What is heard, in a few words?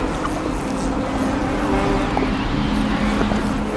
decayed loop; LoL; yorick